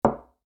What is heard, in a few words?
Cup On Table